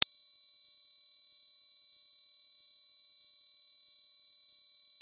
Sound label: 4000hz; testsignalen